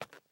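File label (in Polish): kroki cichsze pojedynczy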